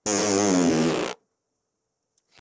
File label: sudden fart on bus